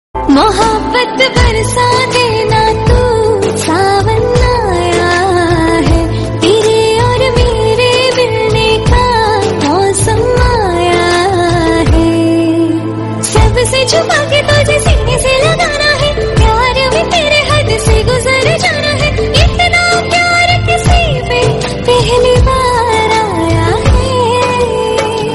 female version hindi

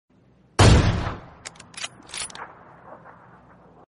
pubg notification tones

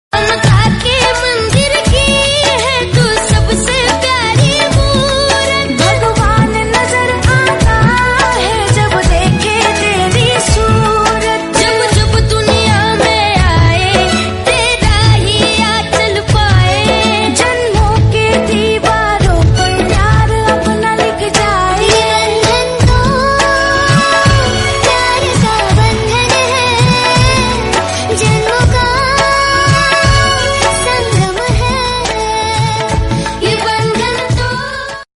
hindi song

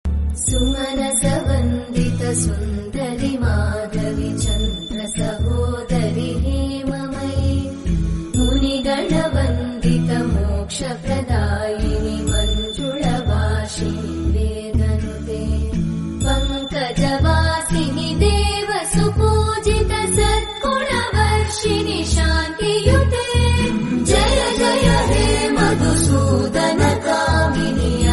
mahalaxmi stotra devotional hindi

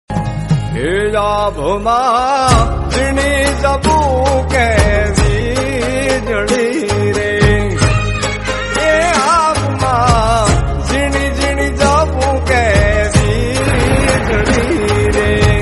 gujrati

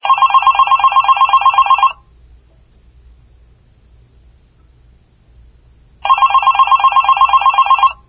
business phone sound

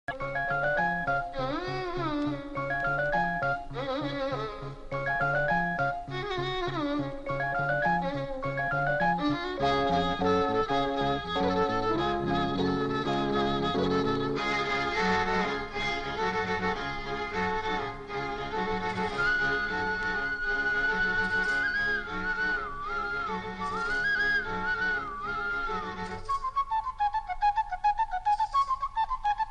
south, bgm; vikram

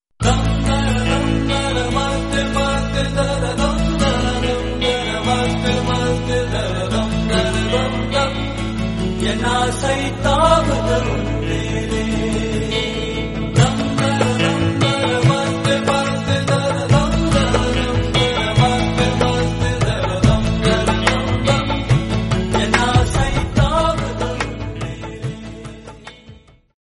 bgm tamil; guru

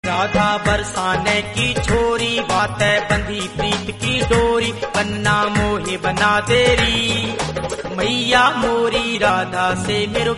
female

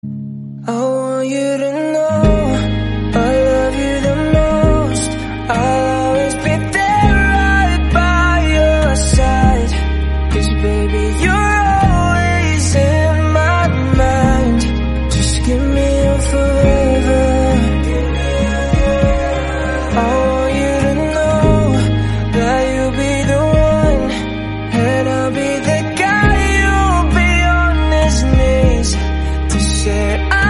slowed, english song

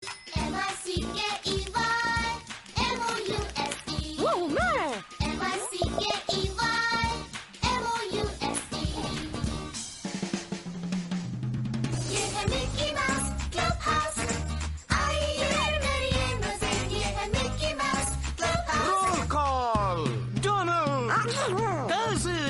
theme hindi song; mickey mouse club house

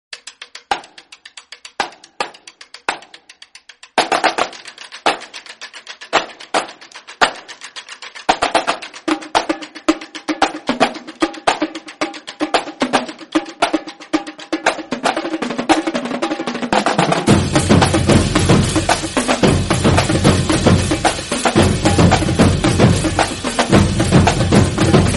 drum interval

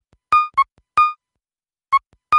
pneumatic; nothing phone

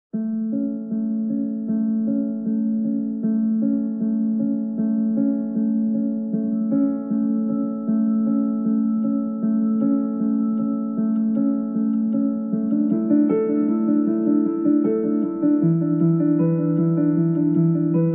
bedtime alarm, instrumental song; apple

